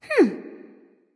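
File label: thinking, nonverbal; smite; voicepack; khepri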